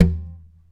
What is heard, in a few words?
TAP, FLAMENCO Guitars